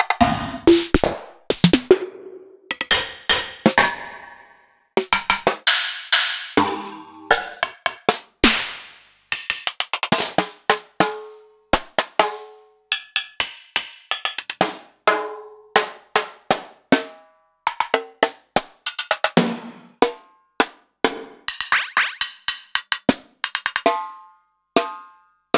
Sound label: EMU Orbit